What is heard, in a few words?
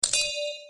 wheel starter